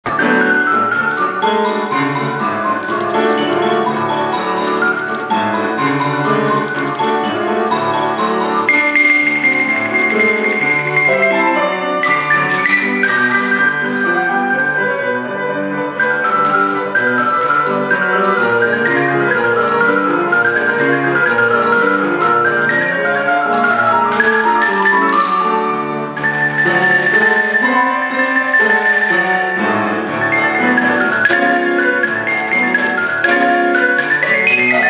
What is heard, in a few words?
Xylophone